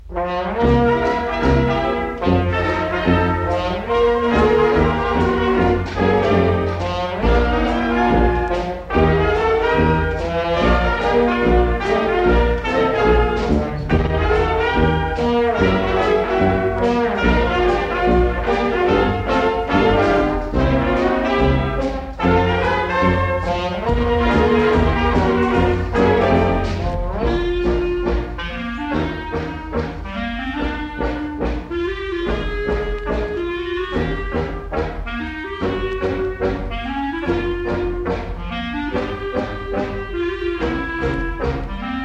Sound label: big band dixieland